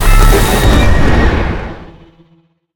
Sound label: Portal; Smite; god Izanami